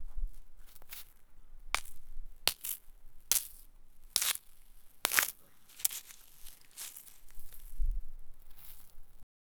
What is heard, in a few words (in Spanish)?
frutas giratorias que, fruits